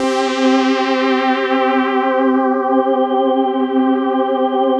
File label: SYN K-2000; Sound & Vision - Gigapack I CD 2